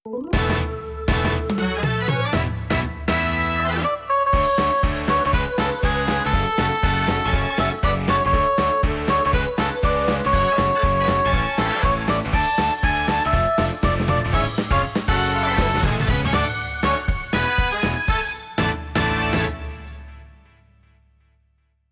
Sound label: BGM Karaoke Jpop; tokimeki memorial girls side 4th heart; Scenario